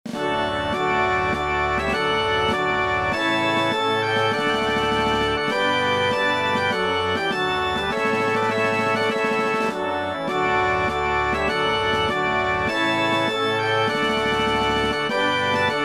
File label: organ and brass; Prelude to Te Deum